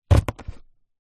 office pen ball point pierce into card box

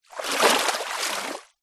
nature water shallow splash creek foot movement

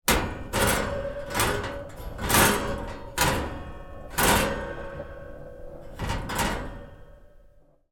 household gas fire metal guard hit rattle

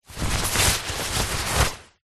foley jacket raincoat arm body movement single; zapsplat

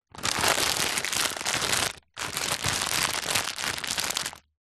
foley plastic packet light handling

